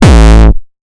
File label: Kick Gabba Kick